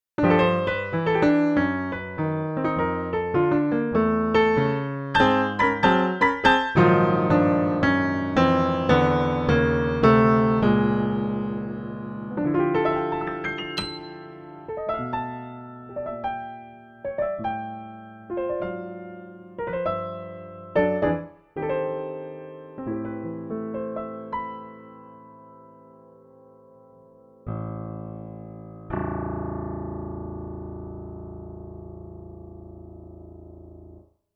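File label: Bechstein Pleyel Steinway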